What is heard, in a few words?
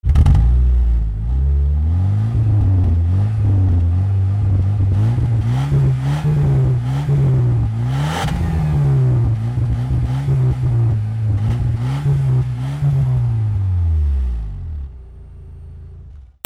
CARS